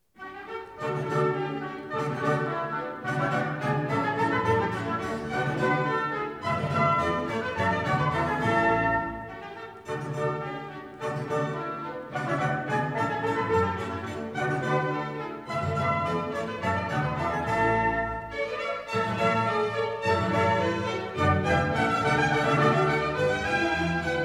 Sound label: Violin Sonata in B minor; Carl Philipp Emanuel Bach; H. 512, Wq. 76; Allegro moderato